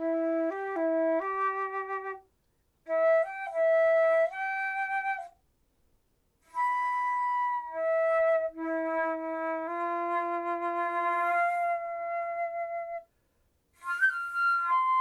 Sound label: flute